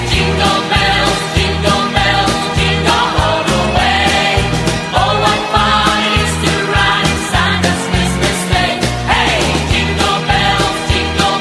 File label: jingle bells